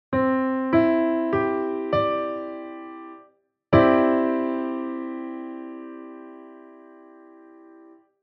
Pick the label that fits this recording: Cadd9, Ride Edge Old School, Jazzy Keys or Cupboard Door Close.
Cadd9